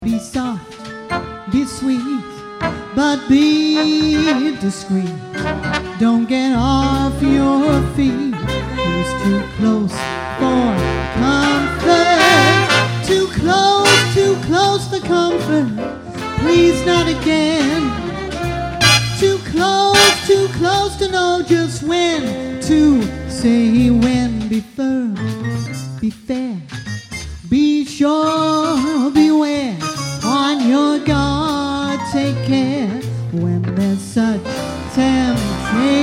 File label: female vocal